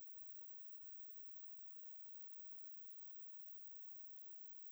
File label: single pickup, Gas Leak; crysound; Handheld Acoustic Imager